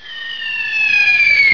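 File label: fire artillery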